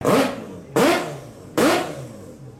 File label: Rotory